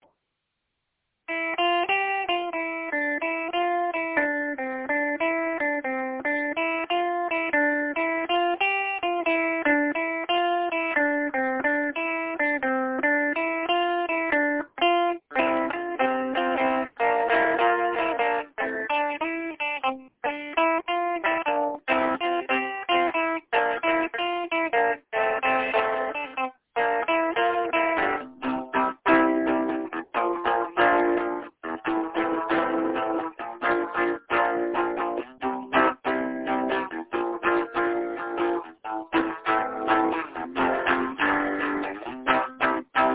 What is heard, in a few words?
starts 80s modal progression ends indie